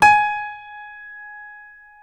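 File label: piano G#4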